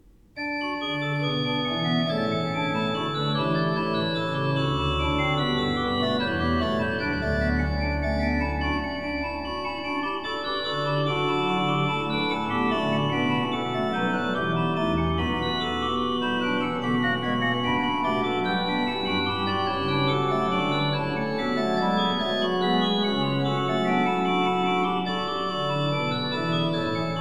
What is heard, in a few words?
organ, choral preludes; Vom Himmel hoch, da komm ich her; Bach; Bach Organ Works